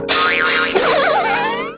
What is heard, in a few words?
tone